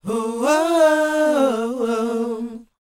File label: WHOA, R&B & Dance vocal; ILIO; Vocal Planet